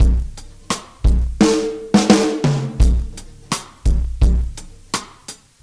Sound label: R&B Drums